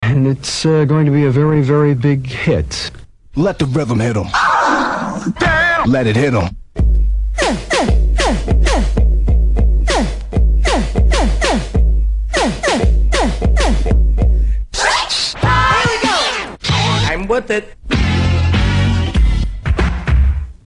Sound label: Scratch tools